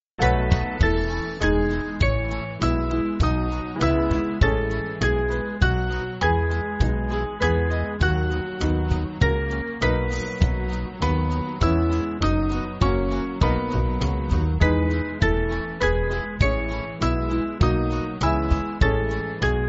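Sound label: Band, Music Backing; Great God Of Wonders; Carey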